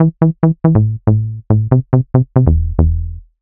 ELECTRO BASS 140 A

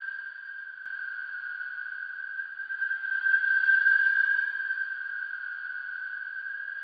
ELECTRO FX 140

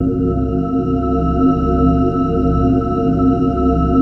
PAD; PADS 2

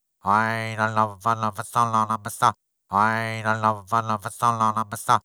ETHNO EXTRA 90; ethno2

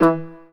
Coconut Pluck

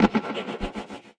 Snare, One Shots, Drums; Touch Loops; BlissedOutBeats

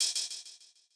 Hats, One Shots; Touch Loops; CosmicFunk

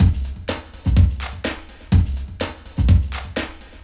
DEEPHOUSE BEATS 125

